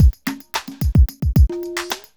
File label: evil beats 110